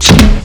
countermeasure; weapons; diprip

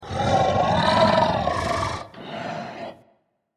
hiss; npc; sporecarrier

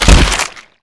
rifle swing hit infected, melee; weapons

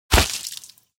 bullet impact flesh

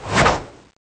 mid slash, snap blade; cso

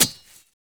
hit other, combat knife; weapons; props dane

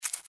cazadore foot; npc

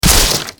damage

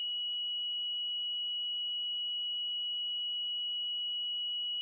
high charge loop

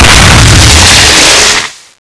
bigger exp, thunder pistol; cso

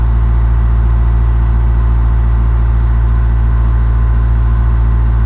c172 rpm; fsx

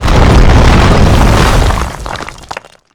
wind buff

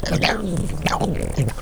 zombies misc idle

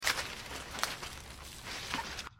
rise; npc; sporecarrier

